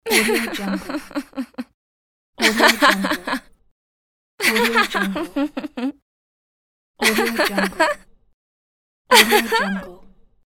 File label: woman smile